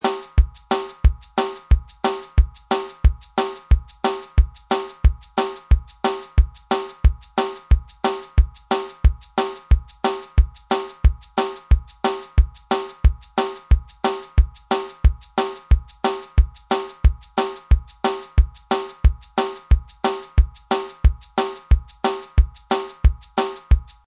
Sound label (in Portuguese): Baterna Ska; Curso Baterna; Aula 2